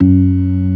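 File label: ORG. F#2; Best Service; Advanced Media Trax Modern Composer; B3 FULL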